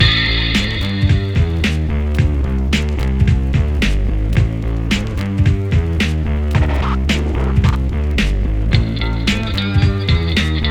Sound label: rock